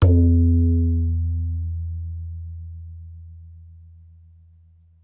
tabla; bahia; Dirt-Samples

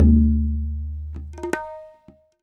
bass flick, tabla; Dirt-Samples

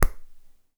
hand mono

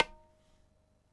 dead hit, tabla; Dirt-Samples

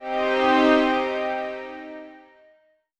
juno pad c minor noise

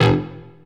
stab; Dirt-Samples

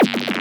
snarl

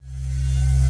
fan bass

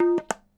hi hit, tabla; Dirt-Samples

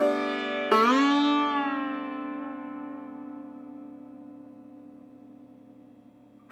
d maj sitar chord

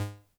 ping; sundance

